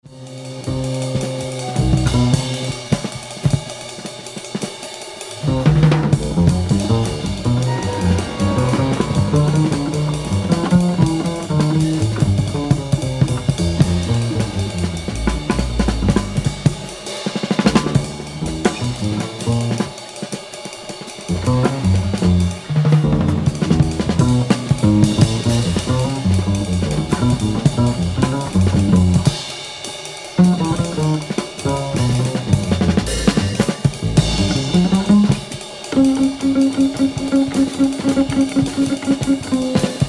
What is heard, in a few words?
Ambient